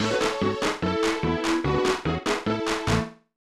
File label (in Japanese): Syosya no Jingle, snes; Ranma 1/2 Ougi Jaanken